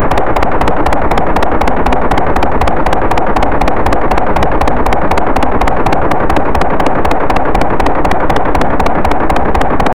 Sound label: kriss vector submachine g